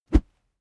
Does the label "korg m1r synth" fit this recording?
No